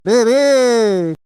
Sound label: uuuu; necoarc